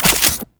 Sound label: Pistol Reload; Warframe; Grineer; Orokin